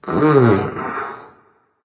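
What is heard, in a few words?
non verbal thinking, voice; cernunnos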